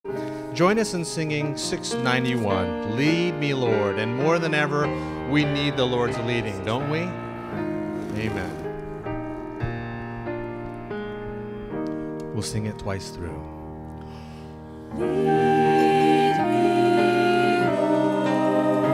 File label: Lead Me Lord, Hymns, Choir; ALL 1 Verse; SDA Hymns; sabbath-school